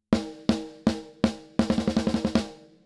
Compressor snare Snare Crack; Lil Snare Crack

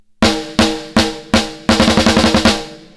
snare part bright

